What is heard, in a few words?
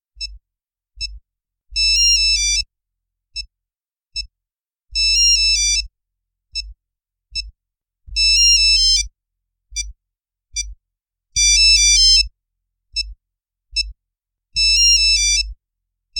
Nokia Classics